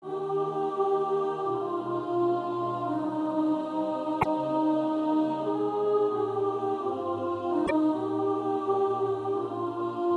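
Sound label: soprano; Lead me Lord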